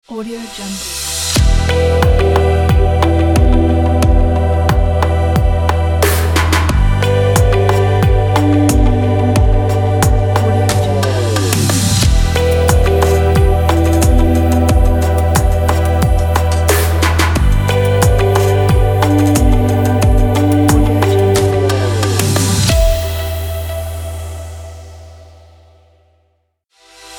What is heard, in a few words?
scifi technology logo pack